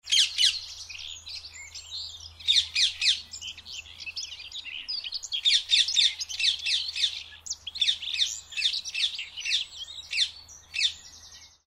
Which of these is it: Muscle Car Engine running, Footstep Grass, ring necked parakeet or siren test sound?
ring necked parakeet